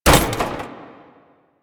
Metal Ladder Hit

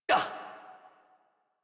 Pain Adult; Warframe; New War; Adult Operator

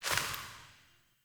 Sparrow Death State One Wing Flaps; Animals

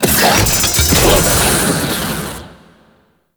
Fortress Flame Turret Deploy; Ambience; Warframe; Grineer Fortress

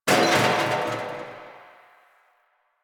Garbage Can Rattle; Ambience; Warframe; NineteenNinetyNine

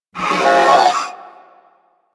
Purgatory Warrior Charge Scream, Barks; Warframe

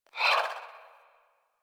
Swing; Weapons; Melee; Balance One; Warframe; Modular Melee

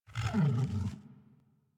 Tame Interact Sleep Wake; Enemies; Warframe

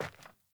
Sandals Walk Metal, Footsteps; Characters; Player

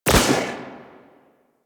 Pistol Prime Shot C; Bard

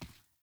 Grunt Boot Walk Default; Sinecure

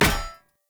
Polearm Medium Impact Default; Weapon Impacts; Player; Environment; Sphere Polearm

